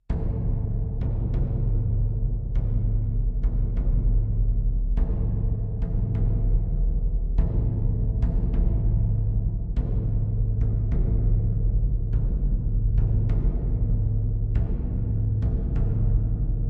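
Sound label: Drums Toms D Deep Scary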